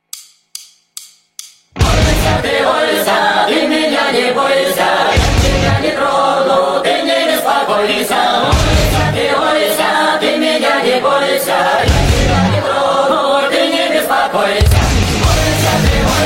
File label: Russian folk metal, speed up; Ойся, ты ойся!